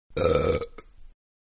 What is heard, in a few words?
Burp Medium; Burping; Human; Manhattan Production Music